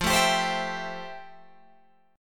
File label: F Major 9th F, Guitar, Open G